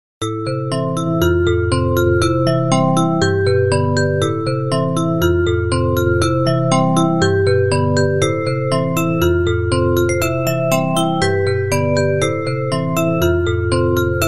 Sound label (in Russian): zvonkaya krasivaya melodiya; Звонкая Красивая Мелодия